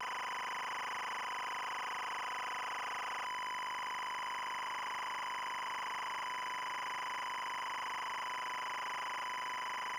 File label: Feedback Ending